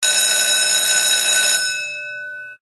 scatter win ring bell; Queen Of Nile

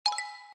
bonus pick; games; American Gigolo

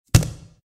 THUD; Book Of Ra DX6

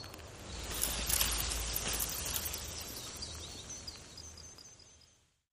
freespin intro; The Golden City

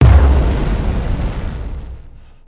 impact; Gem Star

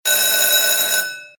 RING SHORT; Helena

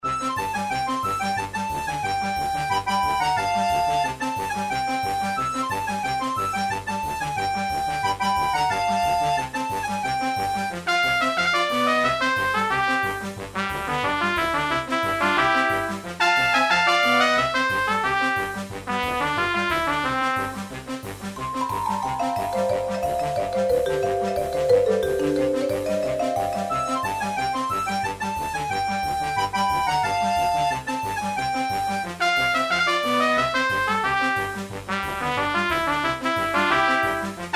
circus reelspin fg; games; Crazy Circus

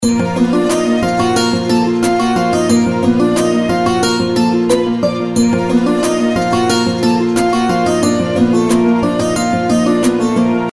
outro bgm; Egyptian Mythology

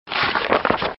PAGE; games; Book Of Ra

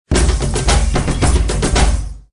WIN SMALL; Gorilla

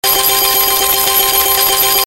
collect; Tweety Birds; slot

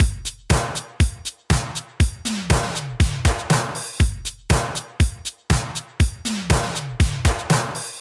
BGM Win; Seven Seven Seven

